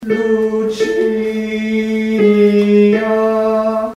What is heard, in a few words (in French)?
soprane; Ecce Quam Sit